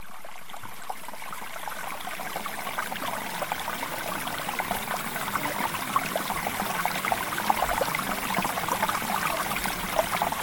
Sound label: Mountain Stream; Premium Subliminals